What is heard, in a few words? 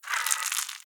Egg Shake Louder; Devour; The Inn